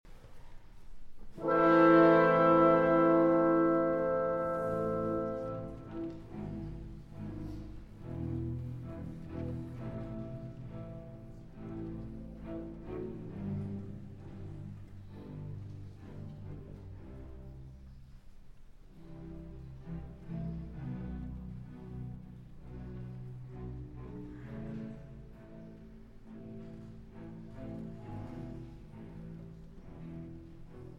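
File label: Symphony in A Major, Allegretto; Opus 92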